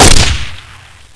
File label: deagle, weapons